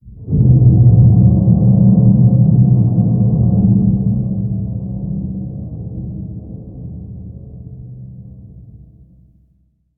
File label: Pylon idle; Barotraumatic